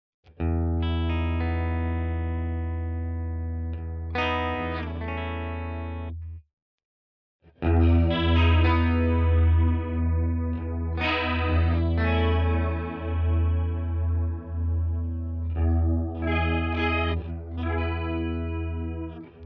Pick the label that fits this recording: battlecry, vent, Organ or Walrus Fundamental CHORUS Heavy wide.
Walrus Fundamental CHORUS Heavy wide